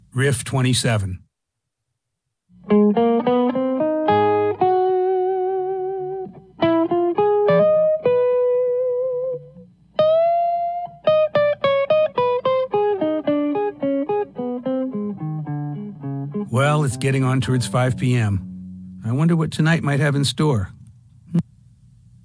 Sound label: Riff